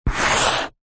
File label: office chalk draw line on chalkboard